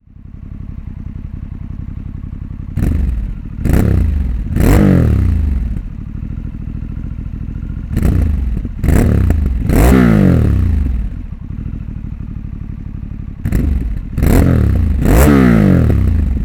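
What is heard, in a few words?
APEX Dynamic Mode with ride by, exhaust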